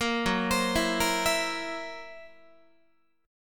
Gb 7th Flat 5th Gb Guitar; Listen to Gb7b5 arpeggiated

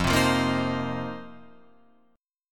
F 7th Suspended 4th F down Guitar Open F

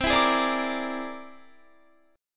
C Augmented 9th, Guitar; strummed; JGuitar

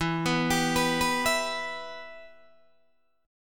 E Minor E, Guitar, DADGAD; 14,14,17,16,14,14; down

